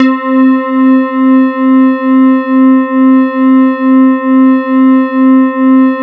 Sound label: E-HARP, DX HARP; Wizoo - Powered DX